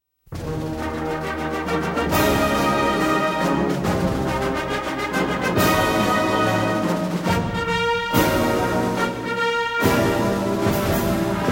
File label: Marching Band; Ohio State U